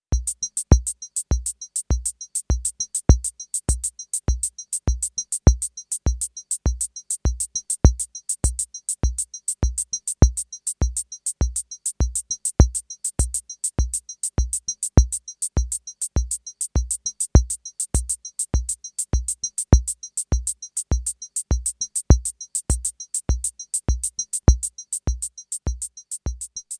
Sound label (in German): Roland CR-78 Bass Drum und Becken